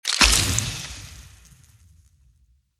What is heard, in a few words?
gambling lose; games; Fenix Play 27 Deluxe; Wazdan